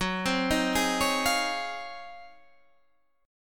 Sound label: G sharp 13th F sharp